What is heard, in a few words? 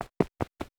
Sprint